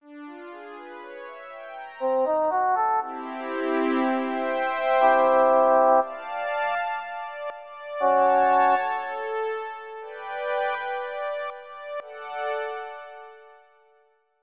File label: Pad & Solo; Onj's Soundfont